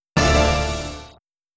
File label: Coffin Jackpot; Coffin Jackpot P2; Scared Stiff; Bally Pinball